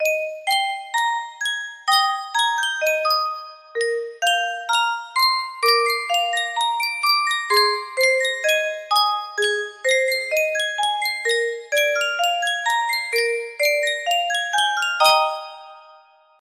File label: yunsheng custom tune music box